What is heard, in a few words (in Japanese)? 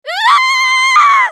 ウィザード女 死亡